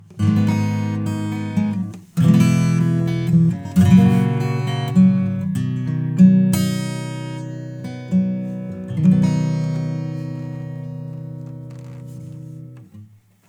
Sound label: Acoustic Guitar; Neutron 4; Sculptor; intensity 100